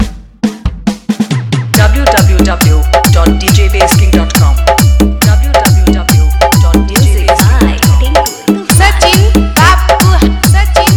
Bhojpuri Hard Vibration Mix; Maghi

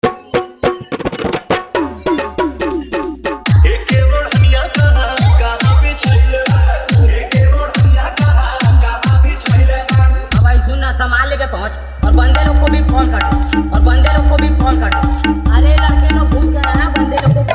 Hard Bass Khatarnak Mix

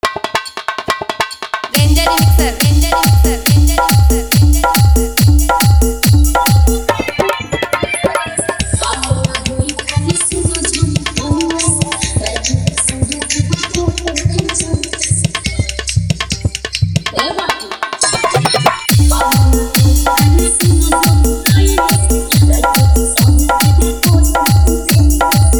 Hard Bass

